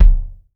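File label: RAW bass drum, Drums Acoustic; USB Soundscan vol.10; AKAI; RAW KIT 6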